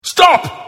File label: Hit Heart; The Elder Scrolls III - Morrowind; Morrowind GOTY GOG